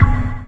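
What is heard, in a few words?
chord stab; E Lab; XTc Files of House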